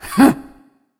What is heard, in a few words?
laugh